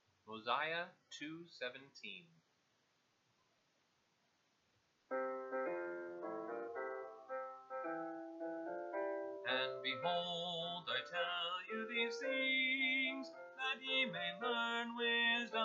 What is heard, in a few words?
vocal